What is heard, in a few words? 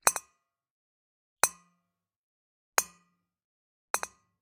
Generic Click Glass Small; boom library; The Interface